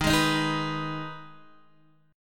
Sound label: D sharp Suspended 4th Sharp 5th D sharp, Guitar Open D; chord shape